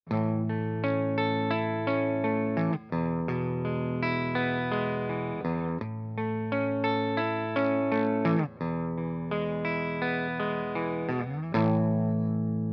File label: Celestion Greenback